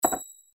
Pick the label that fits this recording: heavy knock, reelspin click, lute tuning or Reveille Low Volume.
reelspin click